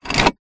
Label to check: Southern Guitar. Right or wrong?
Wrong